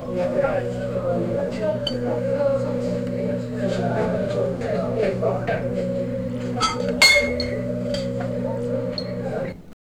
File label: post war tavern ambience